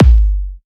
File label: reverb kick g sharp key, kick drum one shot tuned to the G# note. Loudest frequency: 255Hz; kick drum samples; one shots